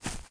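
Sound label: grass run right, footsteps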